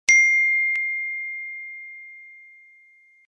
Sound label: iphone notification